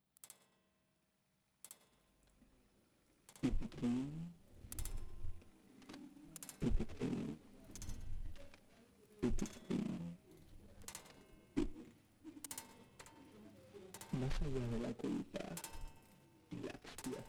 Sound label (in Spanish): armonia